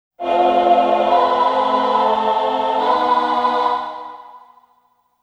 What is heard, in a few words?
Command Melody (Baton)